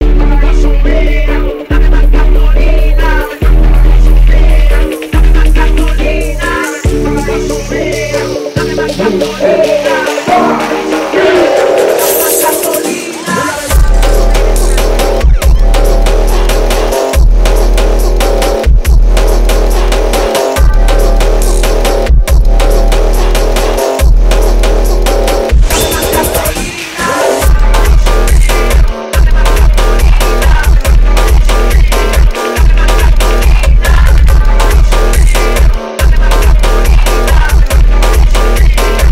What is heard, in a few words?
Phonk